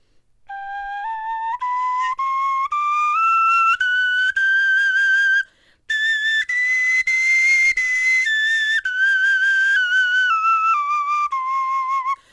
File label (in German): tonleiter